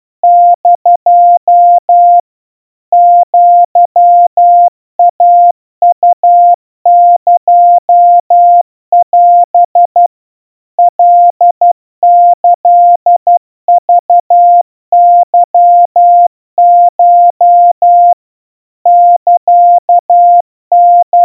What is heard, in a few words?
aiueo 40 cpm 700 Hz Crispy, Morse